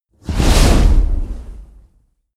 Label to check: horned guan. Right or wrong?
Wrong